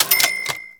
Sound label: MONEY COUNT FINISH; gamerip; PS3